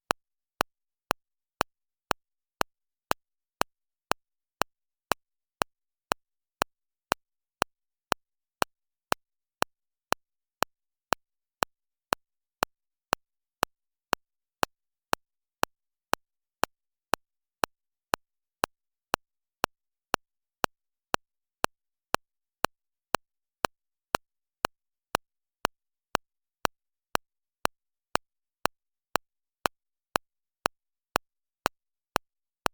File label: speaker pop signal